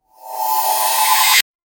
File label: cosmic shot slime